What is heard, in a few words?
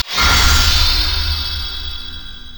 yasuo base knockup alert; LoL